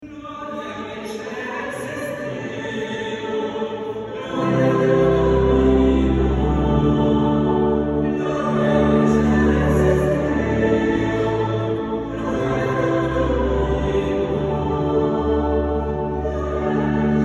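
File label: church singing, Catholic church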